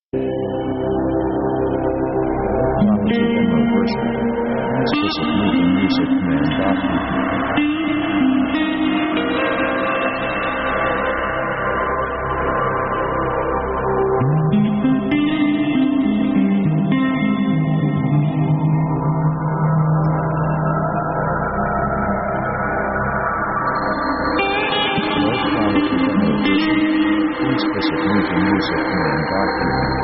Guitar

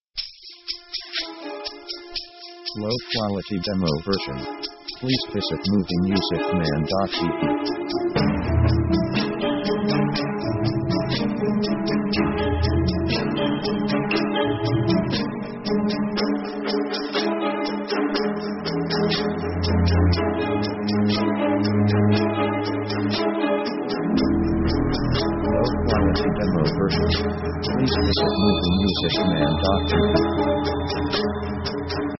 orc threatening movement; Action themes

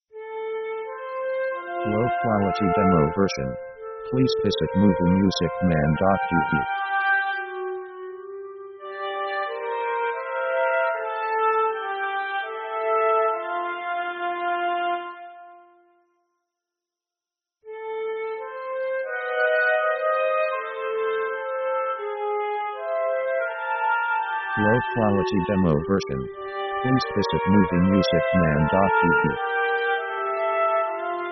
tomorrow we dont know

